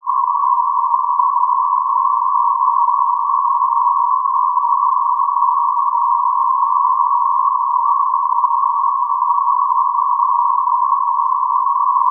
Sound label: noise